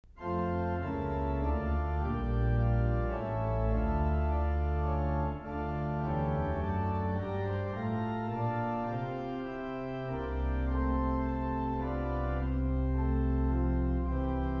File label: Italian Hymn; Selah Recordings